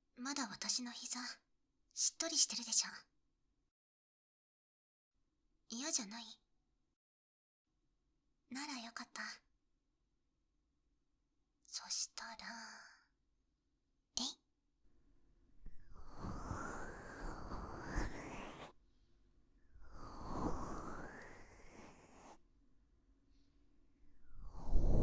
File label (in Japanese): ループ用音源, ASMR